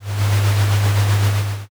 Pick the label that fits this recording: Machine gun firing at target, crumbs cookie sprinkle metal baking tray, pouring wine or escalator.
escalator